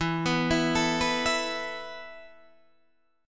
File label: E Suspended 4th E, down, Guitar, Half Step Down